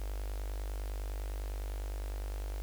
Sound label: gpg mic off